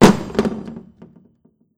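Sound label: stone crumple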